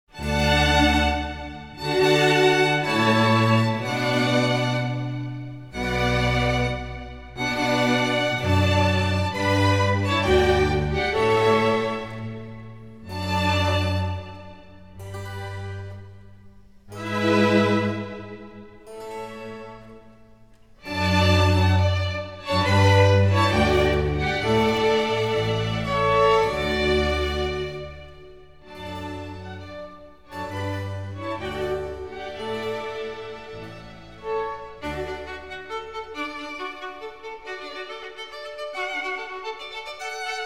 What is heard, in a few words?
concerto grosso vivace allegro